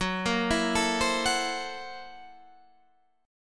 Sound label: F sharp 7th Sharp 9th Flat 5th F sharp